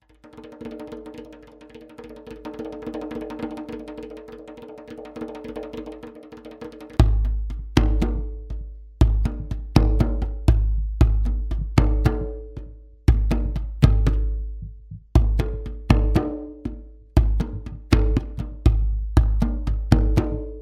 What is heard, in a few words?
Djembe beat